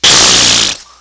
rasberry

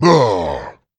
male boomer pain, zombie; Left 4 Fortress

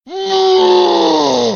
loud scream; tf; noisemakers; donkey kong

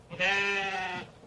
sheep

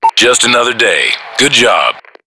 win; payday

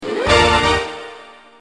assassin killed

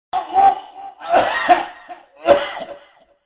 choke

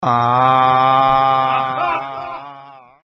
aaah

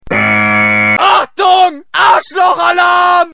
alarm

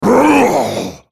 spellcast effort; Volibear

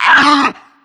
charger pain, zombie; Left 4 Fortress

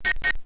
aim finish

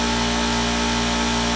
light hum; dod

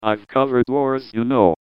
pain severe; pyro; johnmadden

